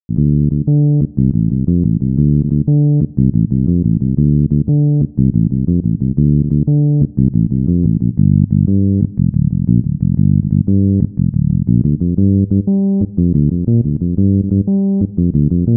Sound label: bass